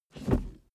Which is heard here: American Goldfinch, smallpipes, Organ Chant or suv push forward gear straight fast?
suv push forward gear straight fast